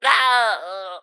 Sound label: Pecheneg Archer Death